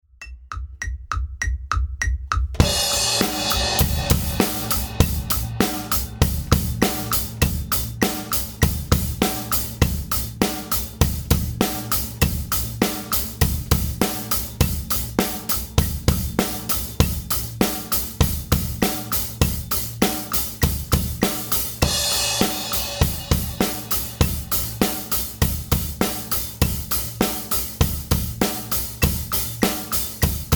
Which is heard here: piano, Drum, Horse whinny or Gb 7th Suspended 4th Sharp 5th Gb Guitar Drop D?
Drum